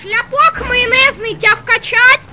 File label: girls death